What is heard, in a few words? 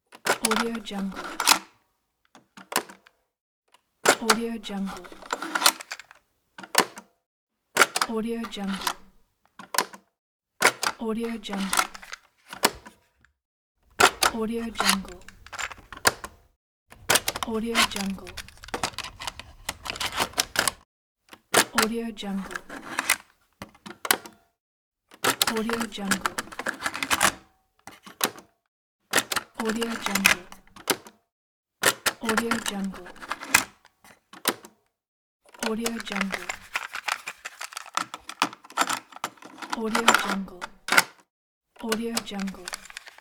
cassette recorder eject insert tape